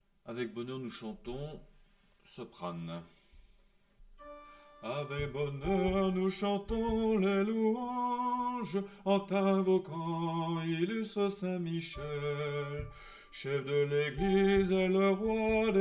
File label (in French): soprane